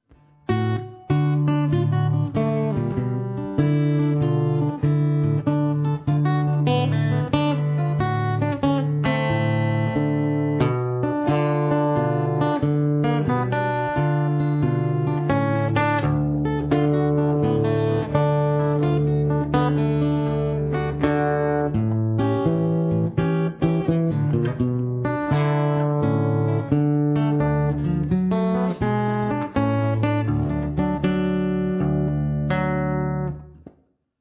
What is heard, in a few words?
bad feelin blues